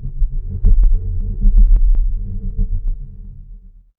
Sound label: magic scifi alien